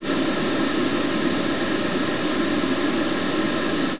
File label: sink